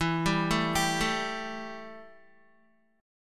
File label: E Minor Double Flat 5th, Guitar; 4F,4A,5C,5G,5C,6E; arpeggiated; 11,10,9,12,9,x; JGuitar